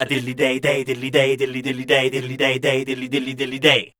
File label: SCAT, Voice Spectral